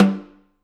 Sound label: K2 room Snr Off